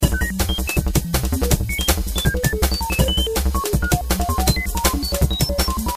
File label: bleepy drum & bass; Logic 5